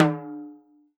timbal lo; E-MU Producer Series Vol. 7 – Old World Instruments

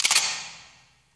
kacha, hard percs; 90 sSampleCDs; Crypton - DrumBase5000

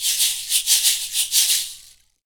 shaker, shaker loops; Roland LCDP14 Africa VOL-2

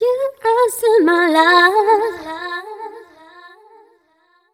f 128 mel e, fem Vocals

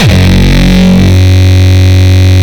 Longkick, kicks

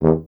BRS TUBA, Solo Instruments

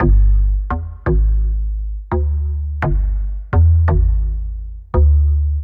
Perc Hard Bass; Sonic Foundry (Sony Creative Software); Knutrix Drum & Bass

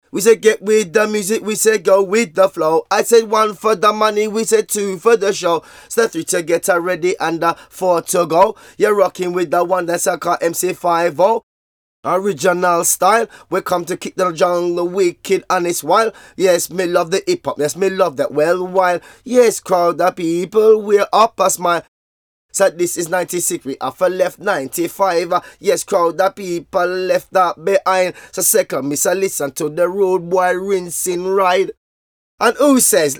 breaks, Jungle; Zero-G Jungle Frenzy 1

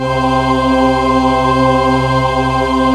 CHURCH, JV-1080; Syntec; Wall of Sounds

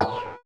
zap; Roland MC-909

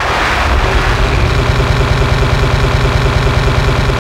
WAR FX; Hard & Loud Techno; USB Soundscan vol.01; AKAI

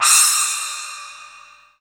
Splash; EMU Orbit-3

